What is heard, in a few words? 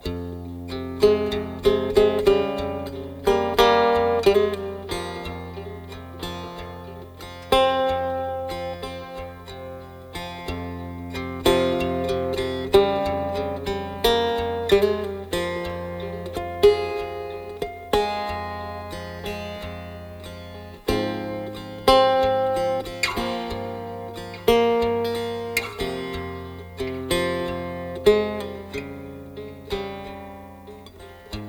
Cigarbox; Un super outil pour des textures aigues et perçantes, la taille d’ un ukulélé ténor